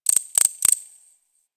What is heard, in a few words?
Forest Close Insect Chirp; Ambience; Warframe; Grineer Forest